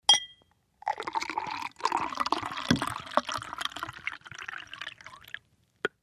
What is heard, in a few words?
Beer Bottle Pour Glas; eating & drinking